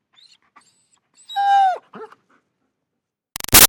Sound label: Dog German Shepherd Whine Wheezy; Dogs; Animals